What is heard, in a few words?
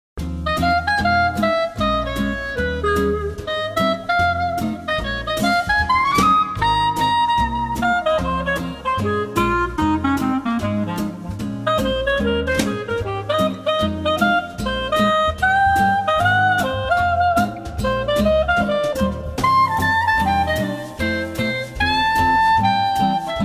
Happy Music Vibes